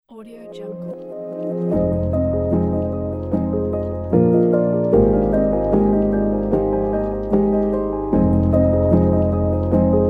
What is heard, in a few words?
documentary piano soundscape